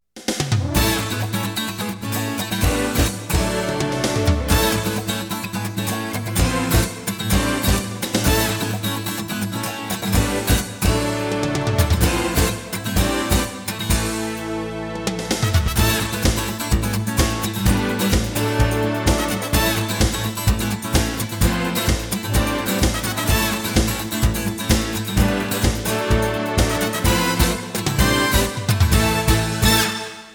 激情的背景音乐

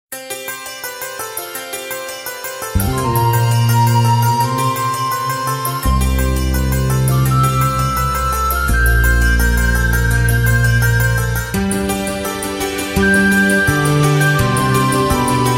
专题片背景音乐

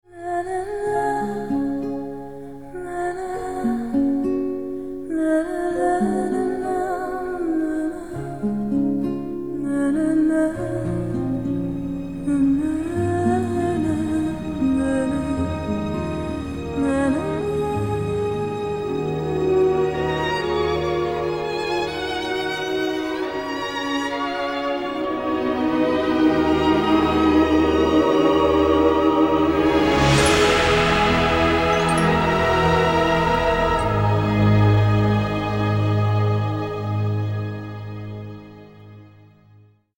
抒情的背景音乐; 1分以内

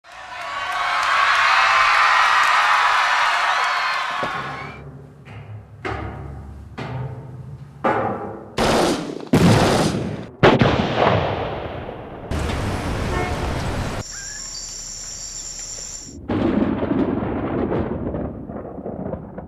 片头音乐; 1分以内

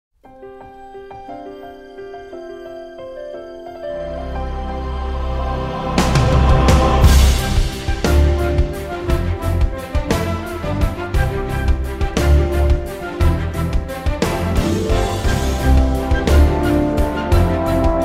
专题片背景音乐

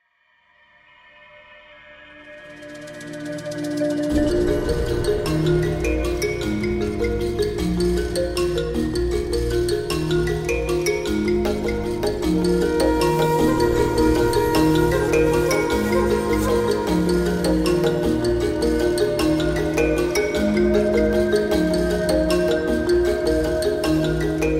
广告片音乐; 广告片背景音乐; 1分以内

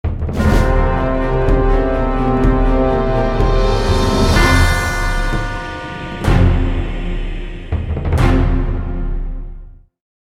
大气的背景音乐

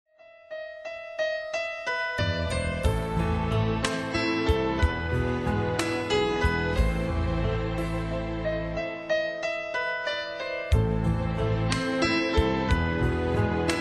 彩铃背景音乐

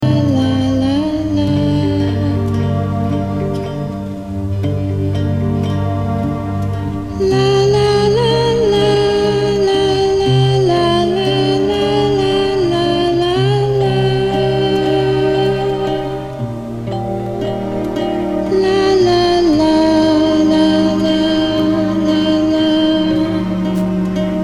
电影音乐; 奥斯卡电影音乐

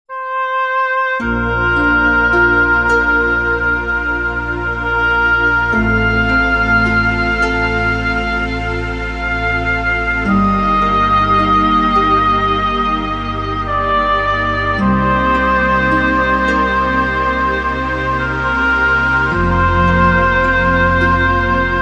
轻柔的背景音乐

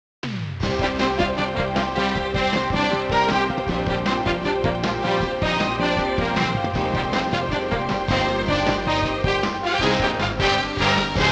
片头音乐; 30秒以内

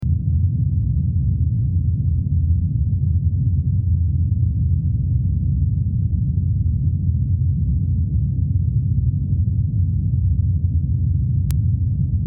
片头音乐

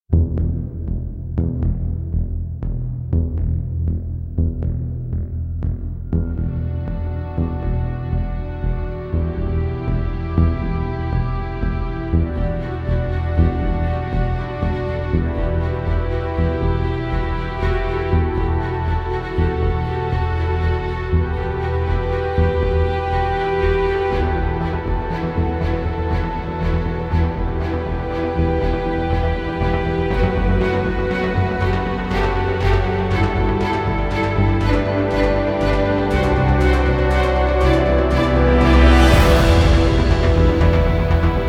豪迈的背景音乐

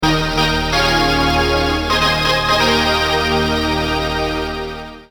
电视包装音乐

